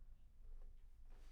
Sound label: Bass Room